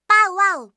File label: bowwow